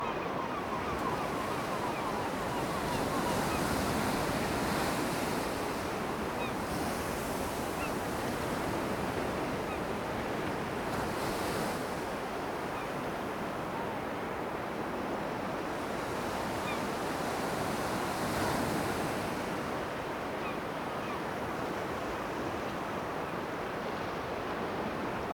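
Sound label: Kent cliff morning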